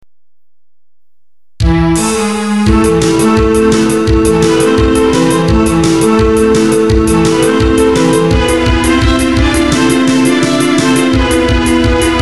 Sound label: midi